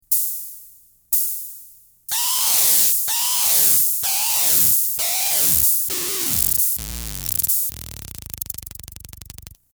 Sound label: cymbal osc; paralleluniverse